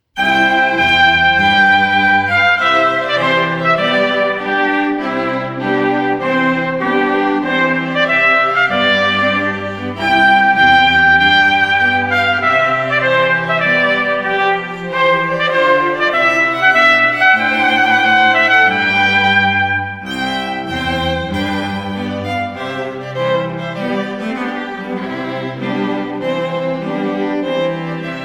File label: String Quartet; Trumpet Tune